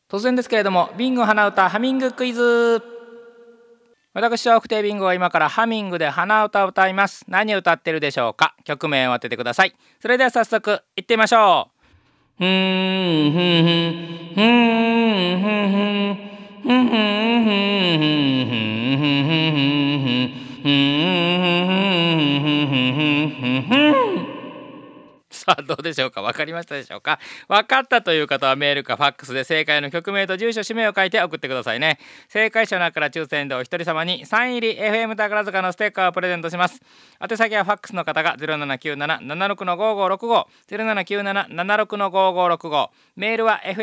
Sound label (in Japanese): hanauta